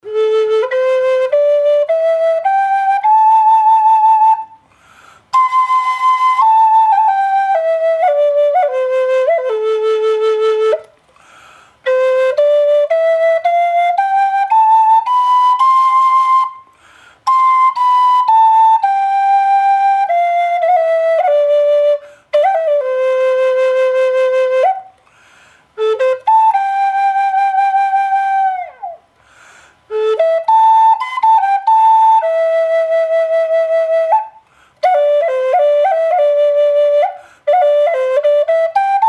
Am dry, flute

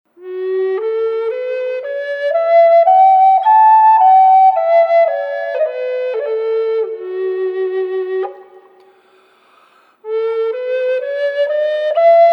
palo santo fsharp m reverb, flutes

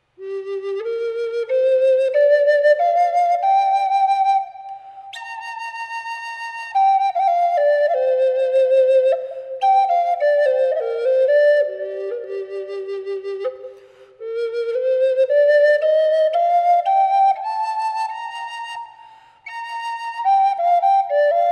colored magnolia g wet, flutes

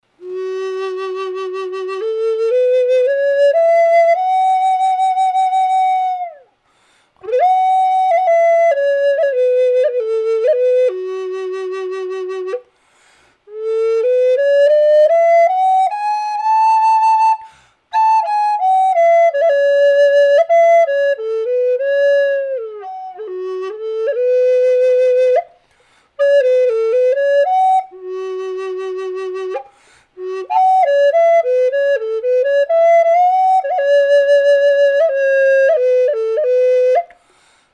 mallee f sharp dry; flutes

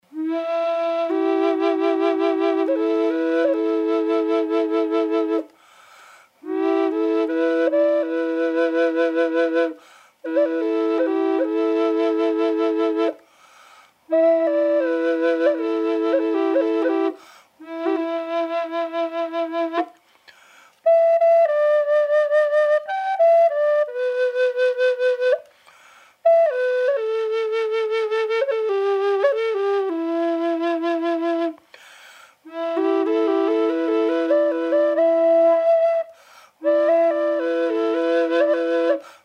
Spalted Em Drone Dry, flutes; drones

